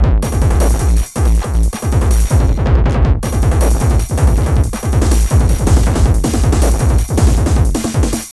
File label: TH 909 160, Hard & Loud Techno